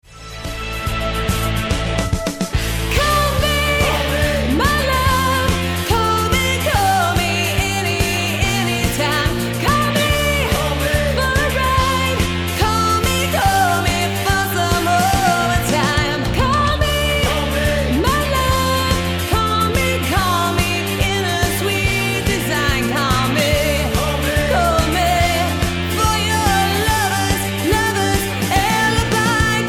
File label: Vocals Down Mix